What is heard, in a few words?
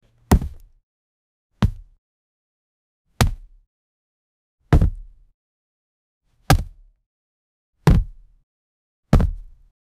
body fall on the ground